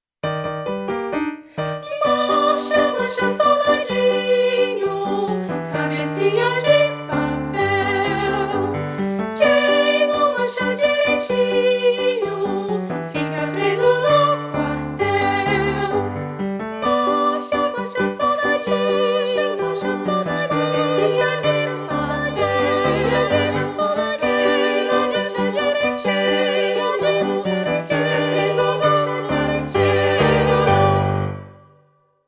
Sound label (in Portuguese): Marcha Soldadinho